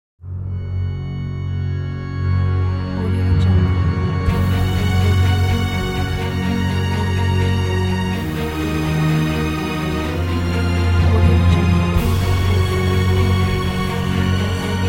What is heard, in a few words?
heavenly intro